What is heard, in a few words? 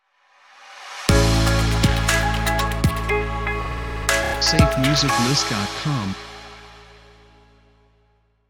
Calm Glitch Logo; SafeMusicList